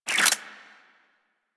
Hand Shotgun Spin; Warframe; Hand Shotgun Prime

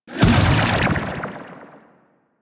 Staff Jab Hit B; Warframe; Monkey King

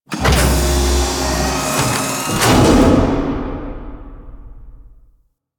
Galleon Core Raise; Ambience; Props; Warframe; Grineer Galleon

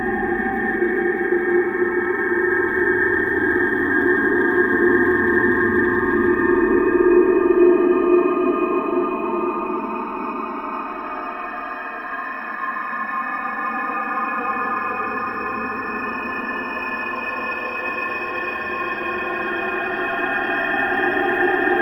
Space Exterior Amb; Ambience; Space Battles; Warframe

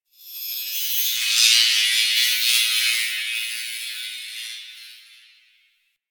Energy Chatter; Props; Warframe; Ambience; Sentient Devourer

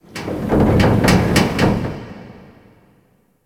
Ice Metal Rattle; Ambience; Warframe; Corpus Ice Planet

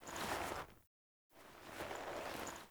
Foley Idle Variant; Orlick; Friendly

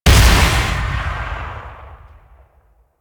Secondary Shot, Weapons; Kuva Hek; Warframe

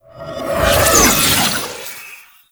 Summon Rune Cast; Warframes; Uriel; Vythelas

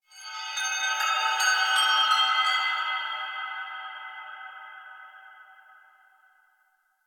chimes, ambience, outpost; Narmer; Warframe; The New War; Quests

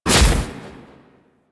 Shield Knock Down; DE; Warframe; Warframes; Garuda

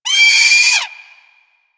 Sea Bird Vocal Death; Warframe; Hunting Critters; Ostron Sea Bird

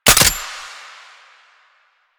Gunbrella Low Clip Mech, Weapons; Warframe; Tenno; Koumei

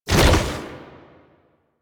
Tank Infested Arm Move; Enemies; Movement; Lasrian; Warframe

